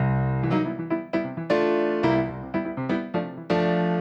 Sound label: Funky A, jazz